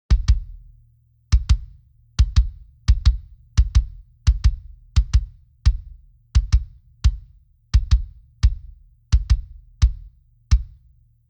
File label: kick drums on